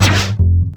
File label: snapback; weapons; dota; kunkka